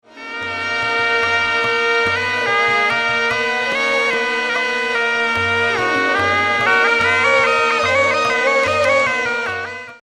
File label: aerophone